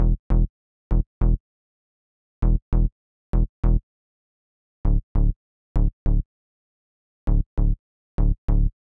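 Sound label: Pop Synth bass, Emin, 099 bpm; Pop Rock; Digidesign; Pro Tools; Pro Tools Essential Loops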